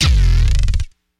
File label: Impacts Sound; ضربه زدن